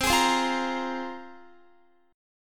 C 7th Suspended 4th Sharp 5th, Guitar; C7sus4#5 strummed

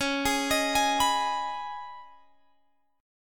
Db 7th Suspended 2nd, Guitar, Open G

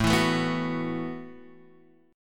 E Suspended 2nd Suspended 4th A

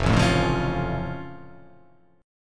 F Augmented F

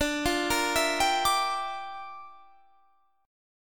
G Minor 13th D

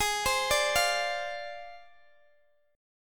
Ab 6th Flat 5th Ab, Guitar, DADGAD

